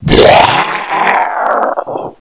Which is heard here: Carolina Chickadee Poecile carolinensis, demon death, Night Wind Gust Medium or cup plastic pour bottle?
demon death